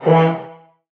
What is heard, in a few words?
vocal center E; Slate; AURORA Sample Pack; Vocals